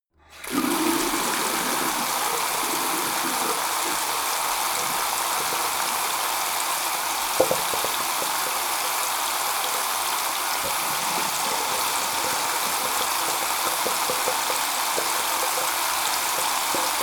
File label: tap flow hard long; haus garten